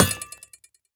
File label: trident ground impact; Minecraft